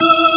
ORG, Organs

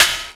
One Shot Snare, Drum N Bass - Percussion

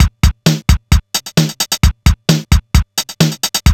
RZ-1 + LOOPS

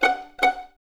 Violins F#5 Pizz; String Ensembles; Miroslav Vitous; 11 Violins

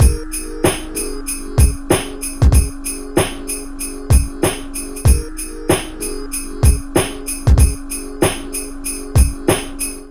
PHATT BEA, Hip Hop

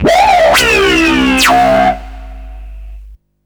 FX, Drum & Bass; Sonic Foundry (Sony Creative Software) - Fluid Dynamics Drum & Bass

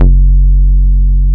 HOUSEY F#1, BASSES; Best Service Alpha Dance vol.2

